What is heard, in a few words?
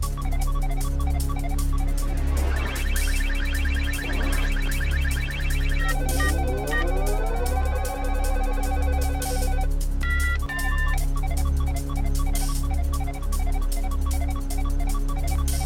Hi Hat; knight rider; Kitt